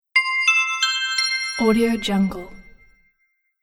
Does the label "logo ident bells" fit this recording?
Yes